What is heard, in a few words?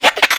bug; bug catcher